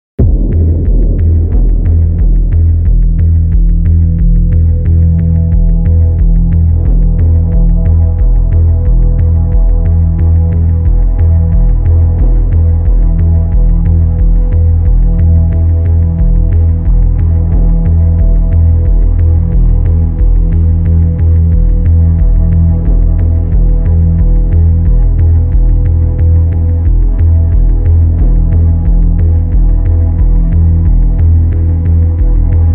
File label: Sci-Fi Lab; Nawak Music